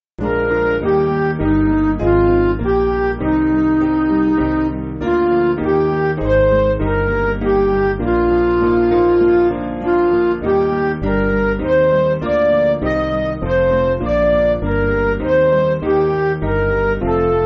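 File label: Music Backing Files for Churches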